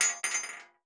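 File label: bullet case bounce 50bmg metal; gmod; viper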